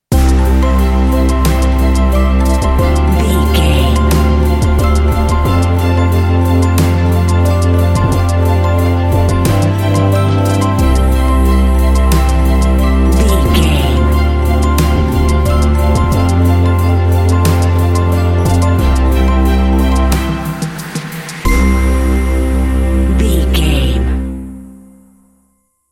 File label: modern ambient 30 seconds